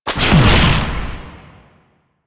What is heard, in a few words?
Amp Charged Shot, Weapons; Warframe; Operators; Amplifiers; Drifter